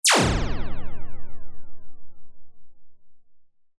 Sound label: cartoon anime burst laser beam energy fast hard